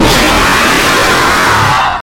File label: jumpscare sound; ishowspeed